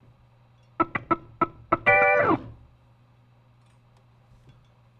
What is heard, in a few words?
Organ, 70s Analog Funk; Sonic Foundry (Sony Creative Software); What it Is!; Slinky's Place